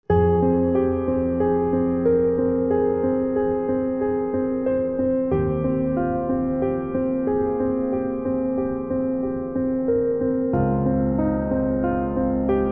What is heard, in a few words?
instrumental, persian